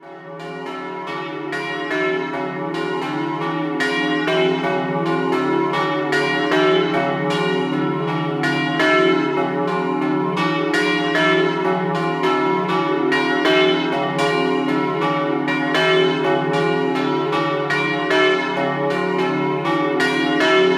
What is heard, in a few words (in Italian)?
Doppio, campane; Raveo